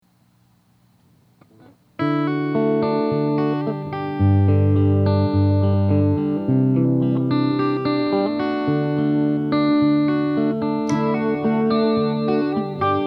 Adding a Bit More Chime (Rate @ Noon, Depth @ 10:30-11am), chorus, guitar; CE-2